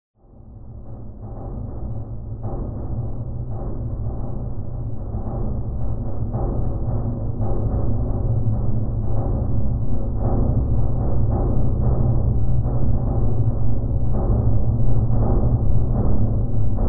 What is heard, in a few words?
Bed Ascend Metallic